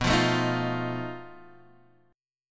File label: B 7th Flat 5th B Guitar Half Step Down; B7b5